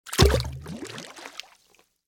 splash

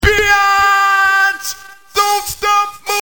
wiggle mega

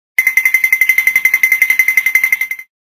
Tip Toe

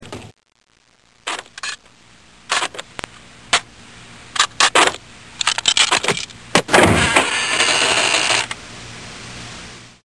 WALRUS

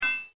anvil

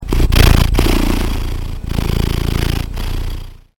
purr

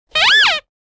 squeeze toy

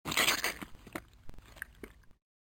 gurgle

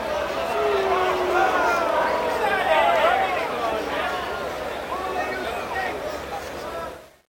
BOO

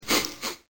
sniff

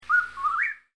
whip

